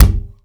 tom drum one shot g key, tom drum sound tuned to the G note. Loudest frequency: 1270Hz